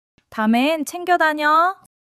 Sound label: laughter